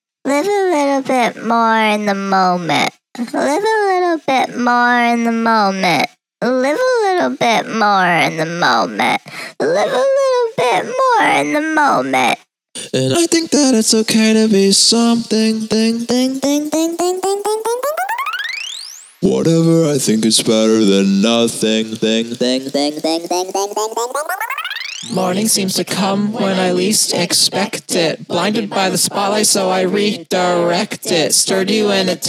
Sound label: VOCALS, 152